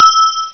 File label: handbell